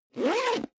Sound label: zipper backpack close fast